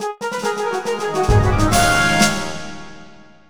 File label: Fanfare, Level Up; Vandal Hearts; Saturn